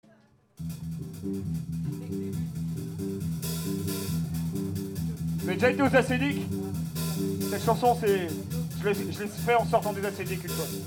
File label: Blues